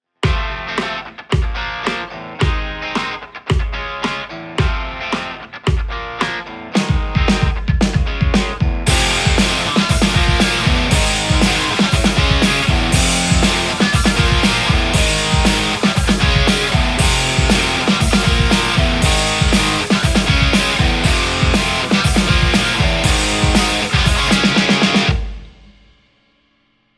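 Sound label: Telecaster; tse x30 v1 6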